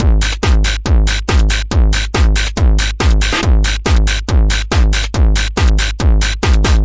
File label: 140 techno, drum loops; 131 to 140 bpm